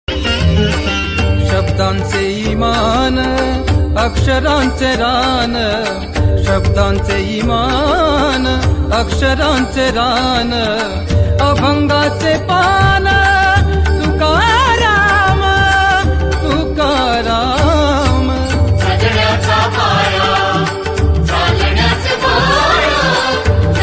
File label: Marathi God